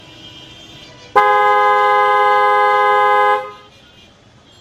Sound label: Slender horn